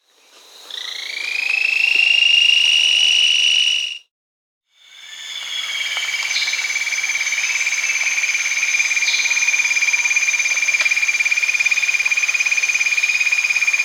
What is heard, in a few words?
Chestnut-winged Hookbill (Ancistrops strigilatus), Peru, Brazil; S. America